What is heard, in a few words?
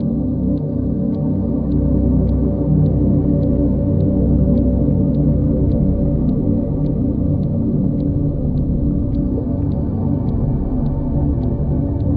clockwork; ゆめ2っき